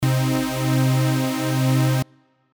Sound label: Chord Lead vco